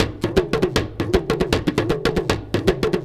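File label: big tama groove; Best Service; World Colours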